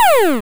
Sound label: Shoot; glitch in the system; godot 4